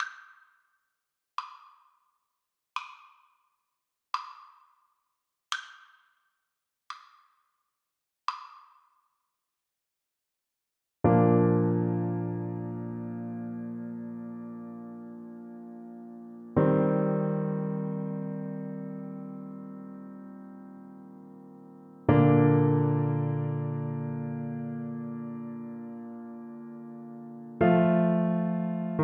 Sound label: danny boy, saxophone